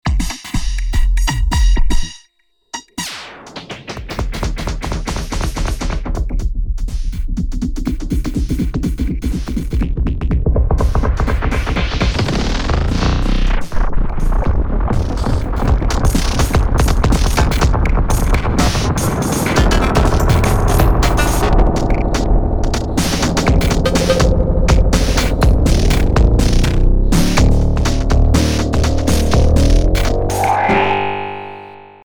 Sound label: Zendelay; Ninja Tune